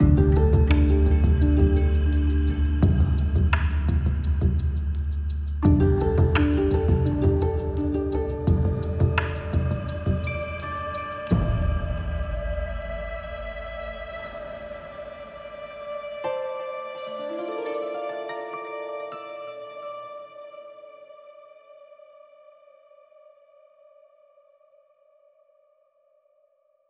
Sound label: music outro; music 1-2 area 2